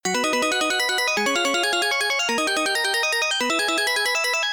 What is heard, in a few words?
Big Win